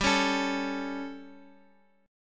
G Suspended 2nd Flat 5th, Guitar; Gsus2b5 strummed; JGuitar

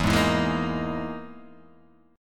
E Major 7th Flat 5th down Guitar Half Step Down

